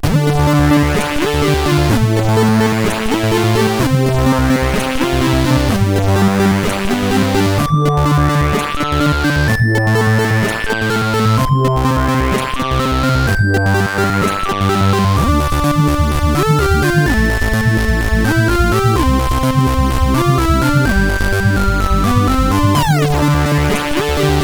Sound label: CSG8580; sid; CSG8580R5